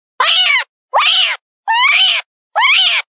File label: Kitten Distress